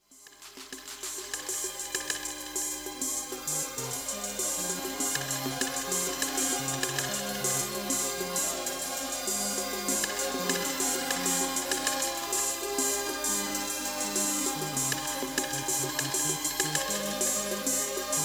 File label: Weltklang PPG 2.3; Bizarre Planet